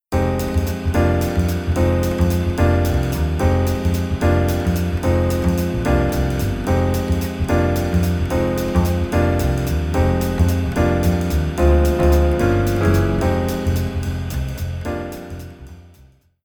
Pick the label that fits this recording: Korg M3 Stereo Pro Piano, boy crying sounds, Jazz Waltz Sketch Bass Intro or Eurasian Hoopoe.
Jazz Waltz Sketch Bass Intro